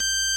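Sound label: ORG Vox G, Continental organ; Continental2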